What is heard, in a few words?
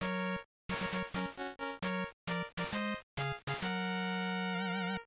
zone clear; chip-n-dale